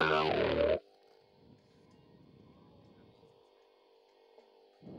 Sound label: Bass Outro Loop